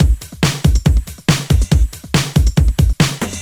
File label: Loops BB 140, BreakBeats; Vengeance Samples